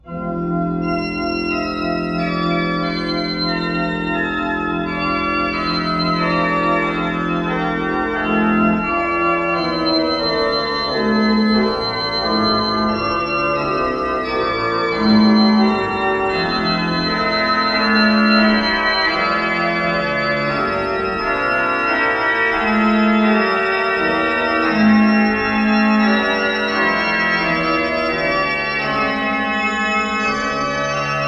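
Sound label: Bach Orgelbuchlein, organ; BWV 619 Christe, du Lamm Gottes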